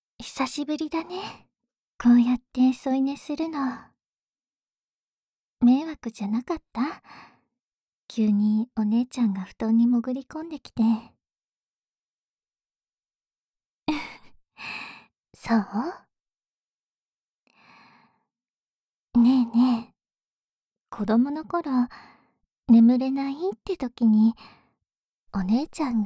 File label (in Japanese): お姉ちゃんの添い寝耳舐め手コキ